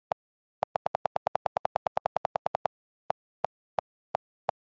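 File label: iphone keyboard delete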